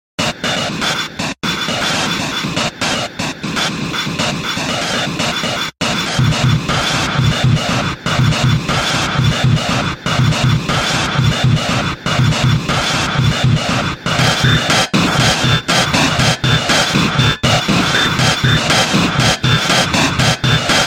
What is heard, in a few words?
air plane